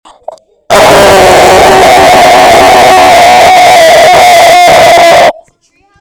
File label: riff